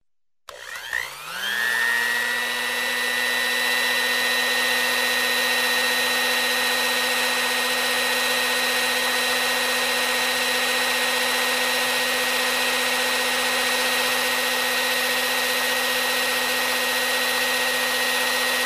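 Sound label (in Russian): миксер электрический смешивание жидкости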